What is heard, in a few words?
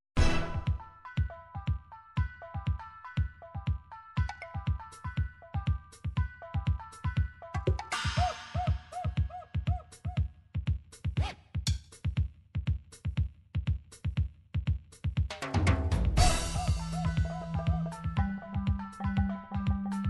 drumz; XG